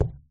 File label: ball cushion, pool